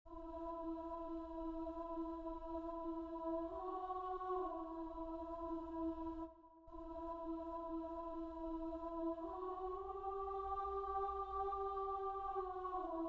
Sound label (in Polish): Alto, spiew; Wielki Czwartek